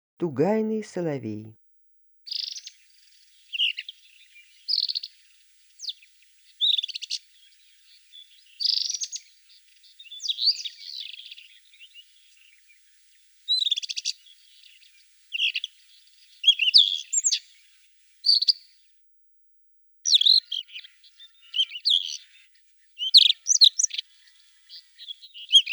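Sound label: solovey tugaynyy golos, nightingale voice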